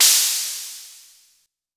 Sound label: Crash Analog, Korg Radias